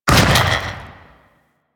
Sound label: Inf Throwing Star Explosion, Infested; Warframe; Weapons